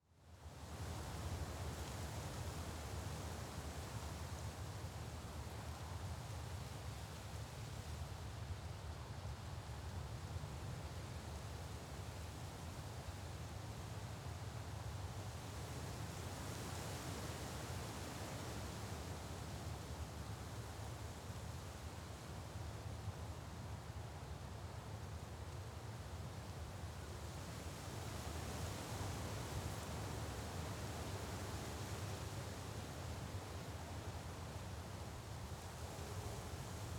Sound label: Ambience Wind Blowing Through Trees; AdobeCC